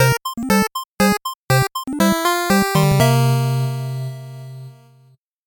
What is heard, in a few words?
8bit jingle slow